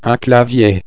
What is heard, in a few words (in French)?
clavier